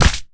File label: falchion